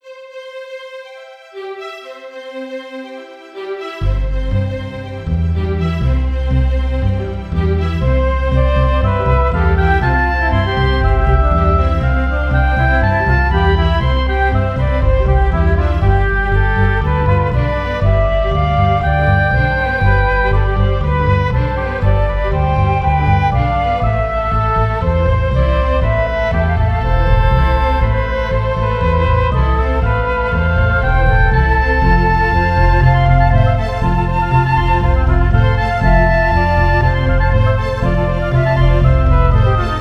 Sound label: generic chord progression song; Benjamin Basford